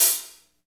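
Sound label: HH, hi-hat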